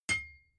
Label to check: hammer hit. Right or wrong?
Right